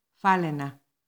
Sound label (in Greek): ΦΑΛΑΙΝΑ